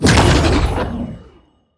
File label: spiders bossfight drain execute